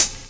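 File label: hi-hat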